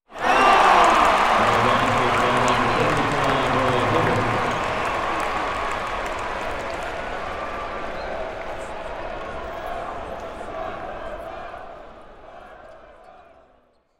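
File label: Arena Crowd Yell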